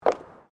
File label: FOOTSTEPS WOMENS HIGH HEELS BOARDWALK STEP; Footstep Foley; Human